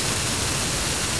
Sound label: sticky bomb fuse; Spearhead Demo